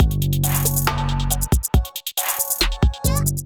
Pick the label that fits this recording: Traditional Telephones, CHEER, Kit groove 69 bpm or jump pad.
Kit groove 69 bpm